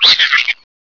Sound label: maggot flinch